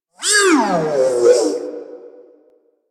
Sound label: Necramech Repair Drone Idle Surprised; Warframe